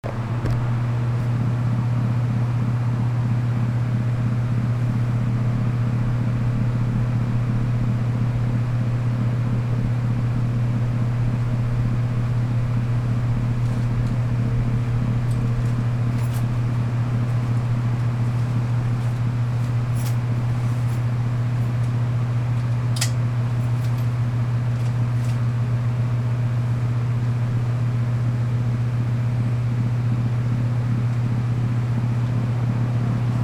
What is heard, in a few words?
Window Fan; Seattle WA